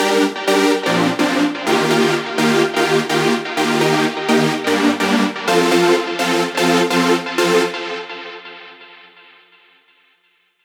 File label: Chords Rhythm Big Room Prog House; midi wizard